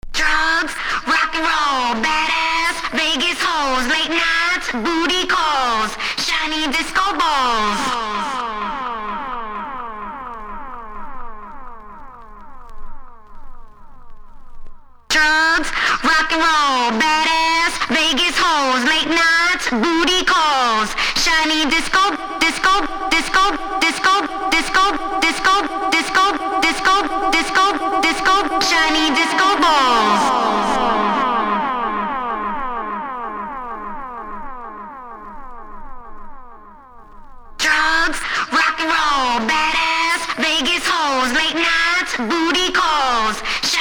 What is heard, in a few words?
acapella